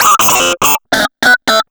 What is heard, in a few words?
dist loop; DISTLOOP KIT; AKAI